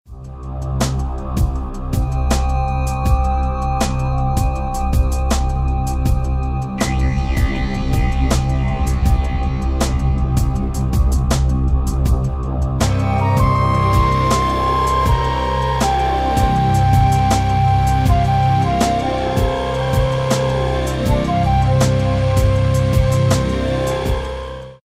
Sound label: ambient pad